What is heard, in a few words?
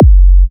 Minimal Kick, Drums, Kicks